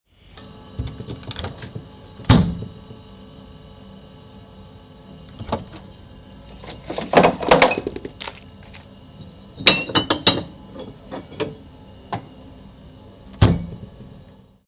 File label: freezer door with bottles